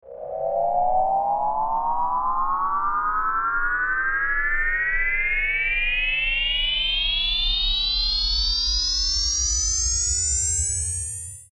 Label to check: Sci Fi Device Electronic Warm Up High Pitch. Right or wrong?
Right